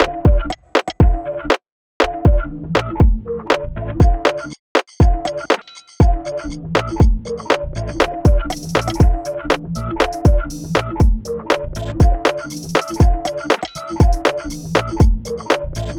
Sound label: loop